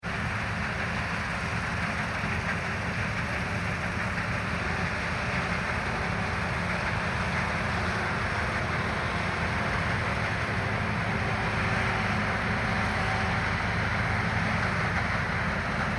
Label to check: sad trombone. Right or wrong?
Wrong